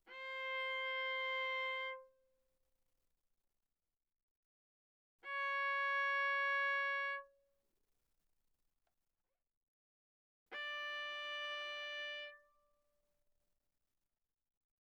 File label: Viola arco sul D ff C5 B5